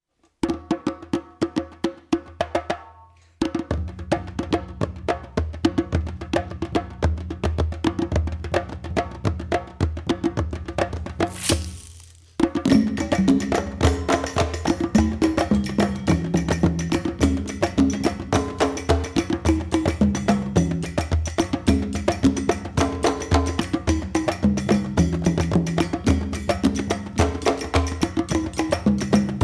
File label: Kalimba